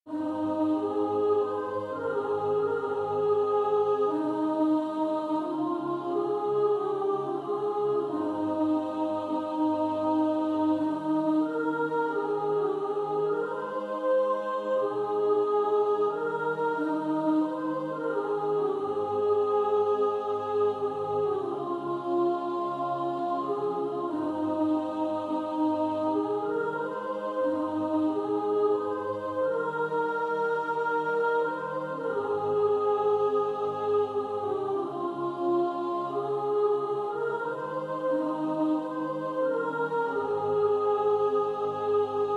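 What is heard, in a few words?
soprano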